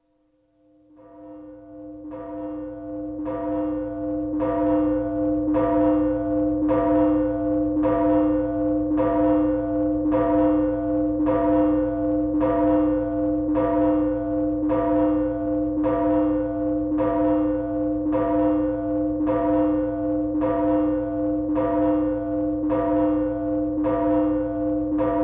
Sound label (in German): lullus, glocken